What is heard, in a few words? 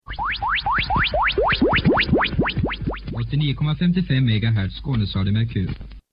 jingle